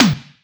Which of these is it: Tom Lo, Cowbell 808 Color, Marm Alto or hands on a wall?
Tom Lo